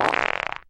fart short

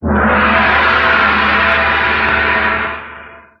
gong; Misc Percussion

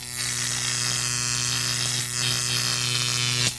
electricity

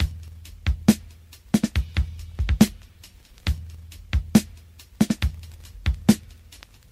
two stutters, Funky Drum loops

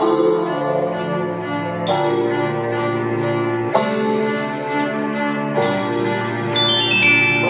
strings, Sad

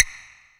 plastic, Misc Percussion Clavas

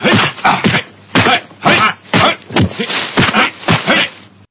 martial arts

